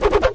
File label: multi hit; Undercroft